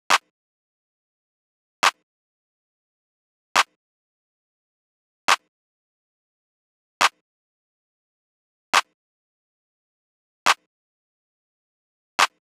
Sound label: 139 Gm clap